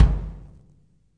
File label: real kick; REAL LIVE KITS; A Real Freakin Drum Kit